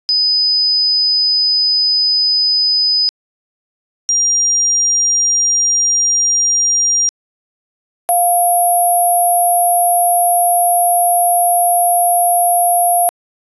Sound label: mix 5000 Hz + 5700 Hz